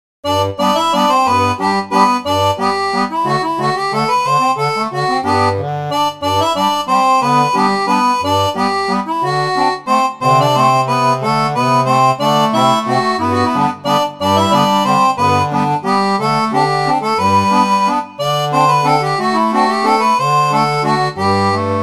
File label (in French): orgue barbarie; seraphone